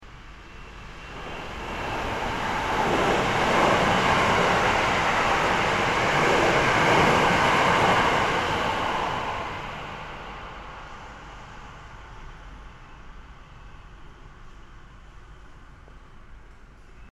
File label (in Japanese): 駅のホームで電車が通過する環境音